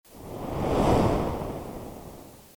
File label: 8 Bit Wave